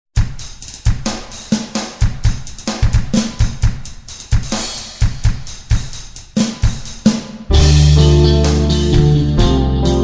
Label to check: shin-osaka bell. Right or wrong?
Wrong